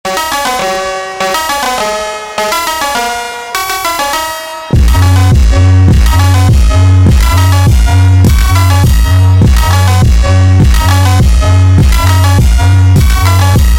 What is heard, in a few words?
House Christmas Holiday Phonk slowed Chill Music Remix